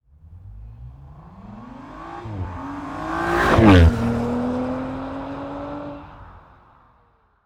Audi RS5 2.9 TFSI Vorbeifahrt

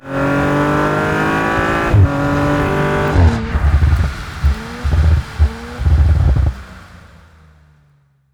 Audi RS4 Rueckschaltung; rs4 avant; audi sport

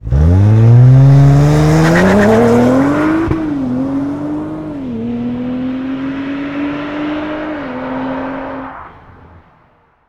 Audi RS4 Beschleunigungsfahrt Aussen; rs4 avant; audi sport

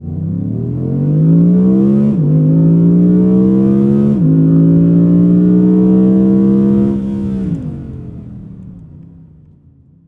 Audi R8 Coupe Beschleunigungsfahrt Innen ohne LC mit SS; r8 v10 quattro; audi sport

